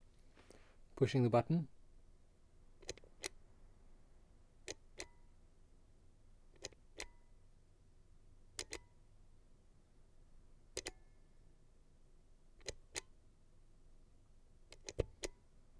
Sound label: Buton Click